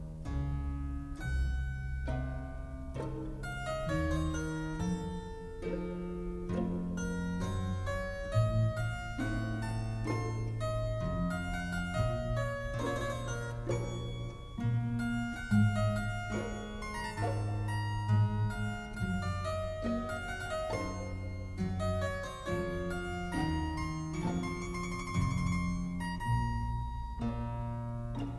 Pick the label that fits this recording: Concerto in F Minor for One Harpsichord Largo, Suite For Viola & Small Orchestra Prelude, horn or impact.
Concerto in F Minor for One Harpsichord Largo